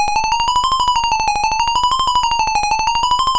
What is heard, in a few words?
Mini Arcade Climax, MOS6581R3; sid